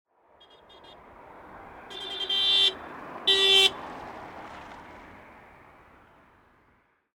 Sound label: Car honking at 90 km h; sound effect